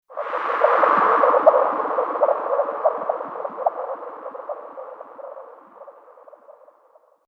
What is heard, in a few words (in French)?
Interlude